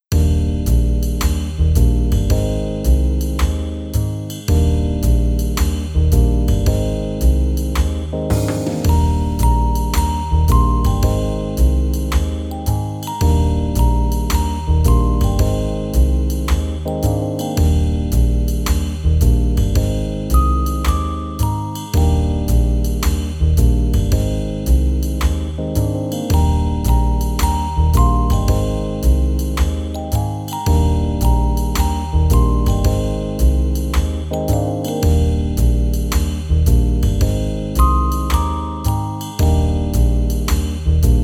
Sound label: Soothing Rain; Esthers Dreams